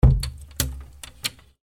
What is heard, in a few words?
window closing